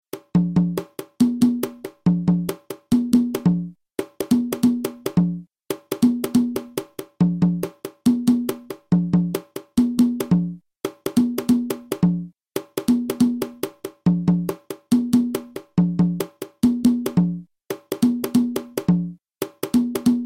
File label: guarapachangueo cajon